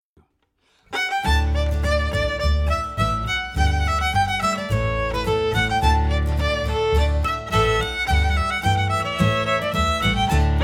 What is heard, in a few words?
a traditional processional, fiddler